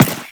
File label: run default, footsteps; Warframe; Teshin